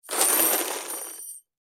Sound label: Impact Chain Drop; Impact Chain Drop Slow; Blastwave